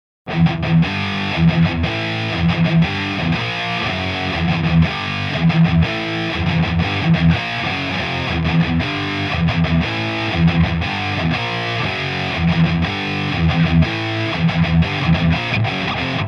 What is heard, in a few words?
vh4 treb 2 2db 2 4k